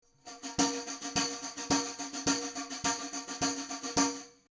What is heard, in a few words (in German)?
Warm Audio WA-67 Tambourin Figure8 90 Grad